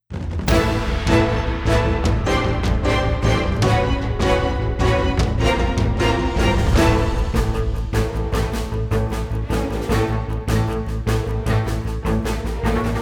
Large Boss Calm - Intro

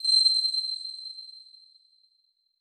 beep shimmer high

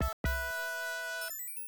Item Fanfare; Flashback; IBM PC AT

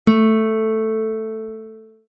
Guitar Note; Extra Lives

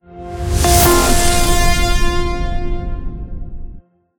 Transition; Everspace 2

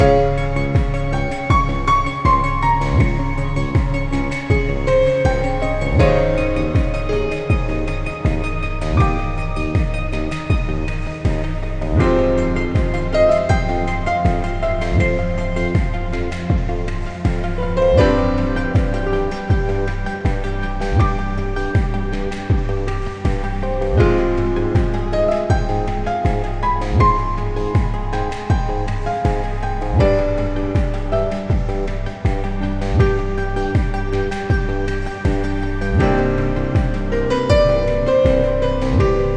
Ui Laboratory; Eden no Tobira

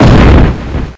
Trop Kick

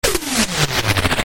Sounds (Throw Disk); Tron; arcade

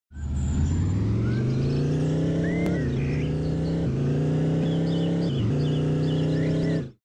Car Sound; Car 1 Sound Theme

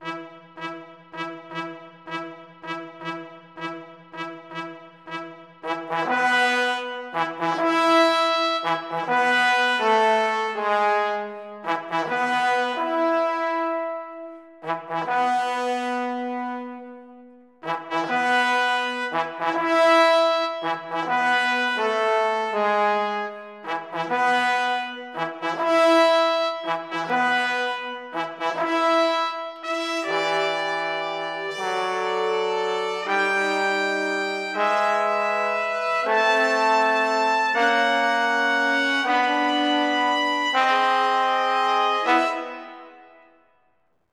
The Kraken Battle Loop-Winning; burly men at sea ios macos ps vita ps4 switch windows gamerip 2016

